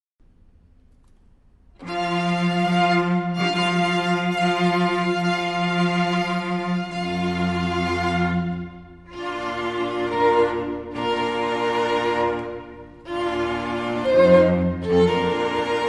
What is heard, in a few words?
bridal chorus, string quartet